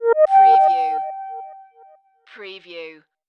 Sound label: Multimedia Chime